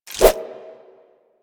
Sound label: Nunchaku Start Whoosh; Warframe; Tenno